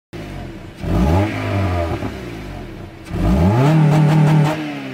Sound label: tuning; carface